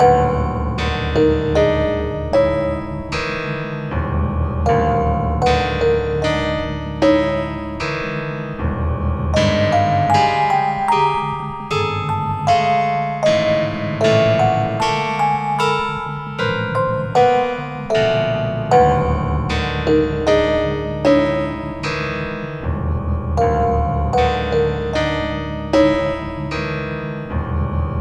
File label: CREEPY